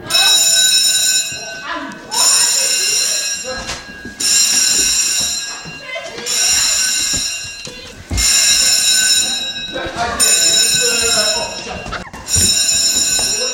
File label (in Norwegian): Brannalarm ringeklokke; Stoetvis